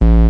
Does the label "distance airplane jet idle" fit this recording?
No